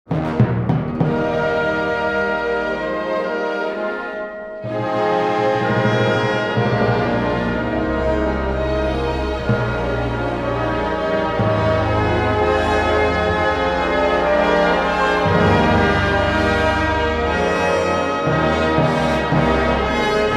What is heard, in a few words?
Czech Anthem INSTRUMENTAL; Hymna Č. r. - krátká verze pro sportovní a běžné společenské účely, orchestr (Škroup-Bok)